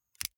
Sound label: lighter electric switch flame on gas